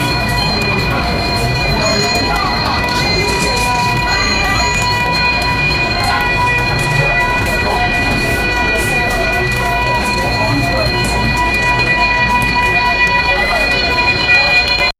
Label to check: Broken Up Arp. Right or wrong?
Wrong